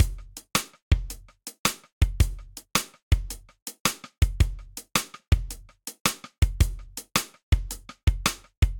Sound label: Rolling Triplets Sidestick Steely Tight 109bpm; Drums; Ableton; Factory Packs; Session Drums Studio